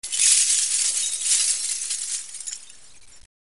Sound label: GLASSW